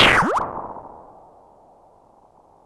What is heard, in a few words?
Cwejman S1 - Bubble World